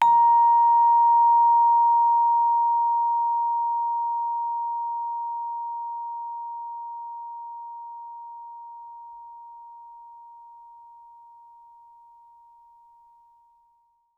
Vibraphone sustain ff Bb5 stereo; MIS Pitches - 2014; Percussion

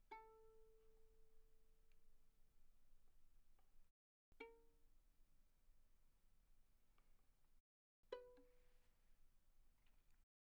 Violin pizz pp sul A A4 B4; Strings; violin2012

